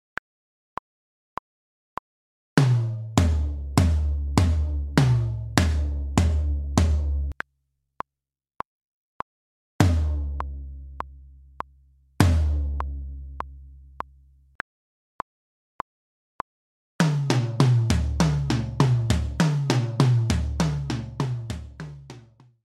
Hoertraining Notenwerte Ausschnitt

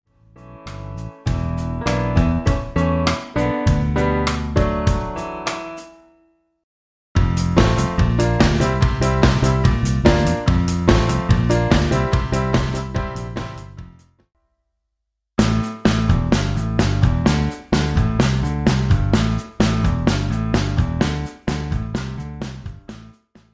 Hoertraining Tempo Auschnitt

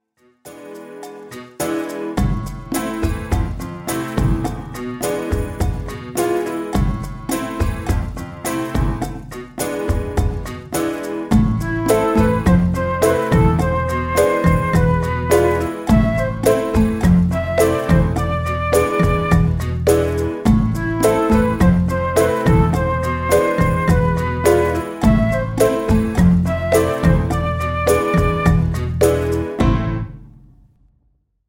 Havanna Rumba

